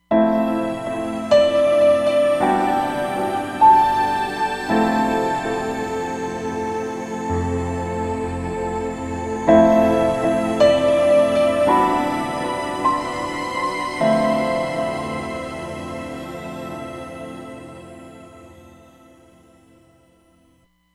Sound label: Cosmo Grand